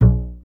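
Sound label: string contrabass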